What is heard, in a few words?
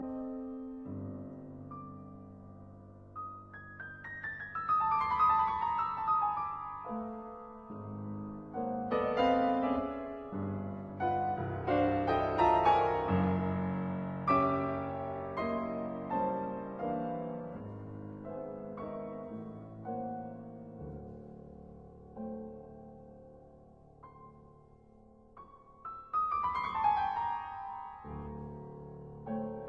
Piano Sonata; Harbison